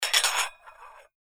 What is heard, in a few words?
stacking plates